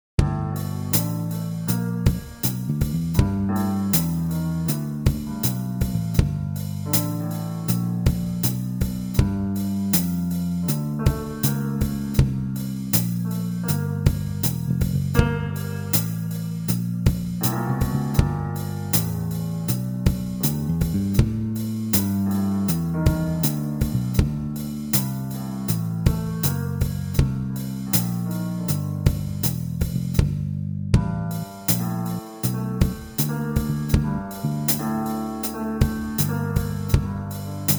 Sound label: Blues